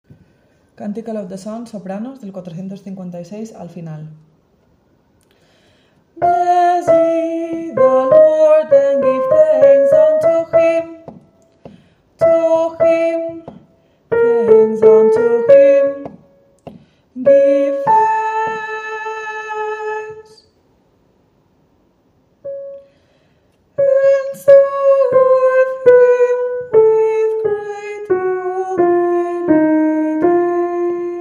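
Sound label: soprano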